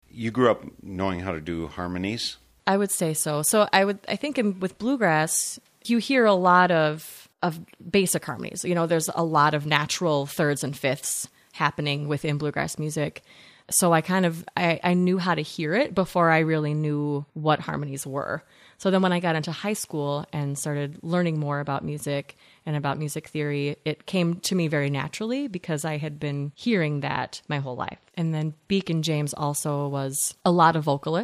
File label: harmonies